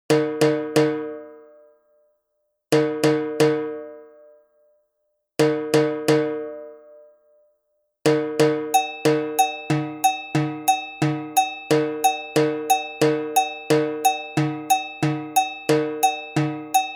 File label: AGOGO SAMBA REGGAE